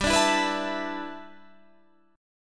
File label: G 6th Add 9th